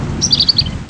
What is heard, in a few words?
rain2